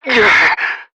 gahh

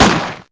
weak gunshot

